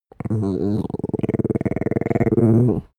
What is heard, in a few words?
dog small growl regular; natur-tiere; hunde